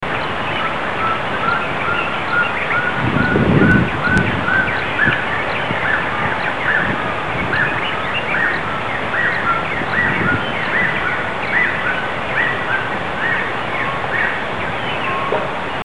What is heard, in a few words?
jungle owlet ne India